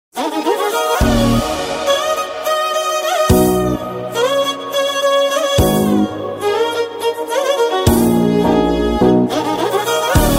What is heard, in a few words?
instrumental ringtone